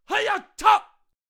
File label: Shadow Walker Attack Vocals